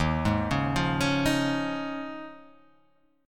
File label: D sharp 9th Suspended 4th, Guitar; D#9sus4 arpeggiated; JGuitar